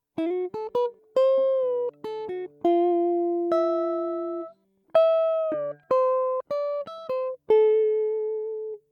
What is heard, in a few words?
lick